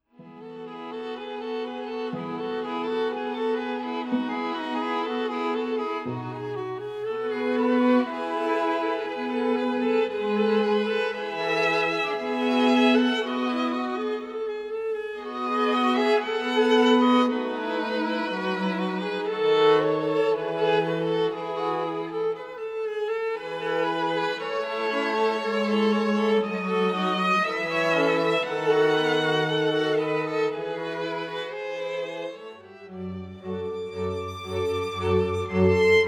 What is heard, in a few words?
classical masterpieces